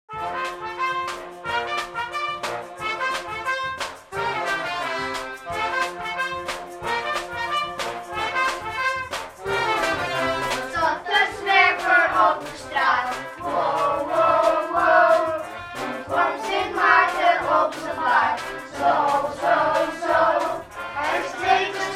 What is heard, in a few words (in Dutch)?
zng koor